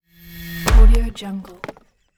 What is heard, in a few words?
frantic hit transition